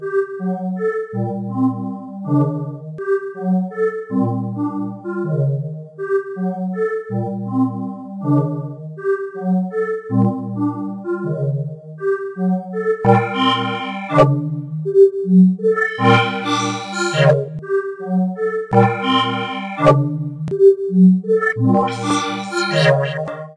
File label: 081 fuckedup synthy melody; SYNTH AND ELECTRONIC LOOPS